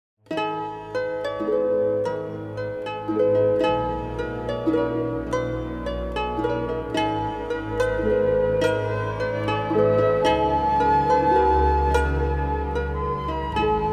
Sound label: harp and dulcimer